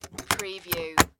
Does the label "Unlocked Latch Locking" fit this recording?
Yes